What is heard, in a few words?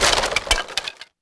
skeleton body; Pirates Of The Caribbean